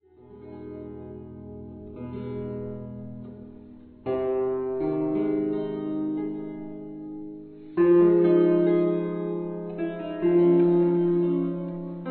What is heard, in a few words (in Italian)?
arpa, Barberini